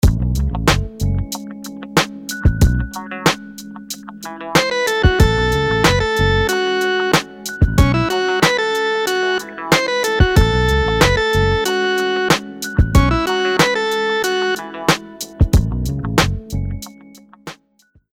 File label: rhythms dotted 8s